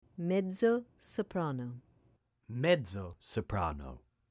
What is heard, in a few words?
Mezzo-soprano